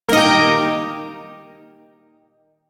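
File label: Shawzin Pentatonic Minor Chord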